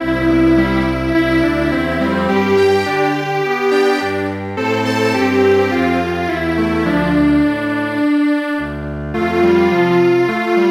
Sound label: Strings Quartet